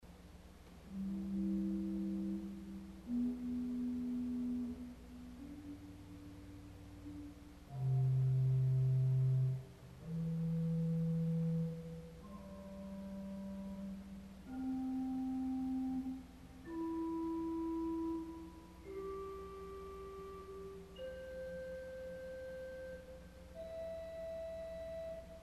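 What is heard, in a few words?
Cor de Nuit Arpeggio, organ stop; CulverAcademy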